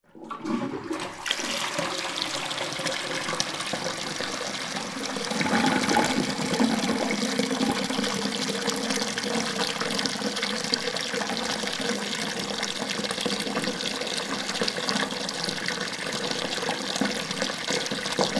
household toilet cistern flush cistern lif off close up